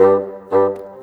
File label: Bassoon, Orchestral, Rock Pop; Sonic Foundry (Sony Creative Software); Orchestral Series VOL-4; Rock and Pop; Rock-Pop 03